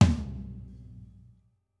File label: Kit, breakcore Drum Hits